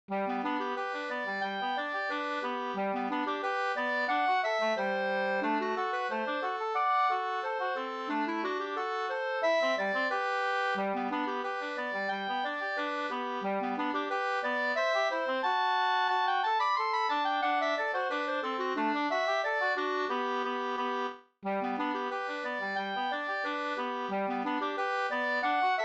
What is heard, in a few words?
polka; e Finnmark Hellstrom Og Nr2 2st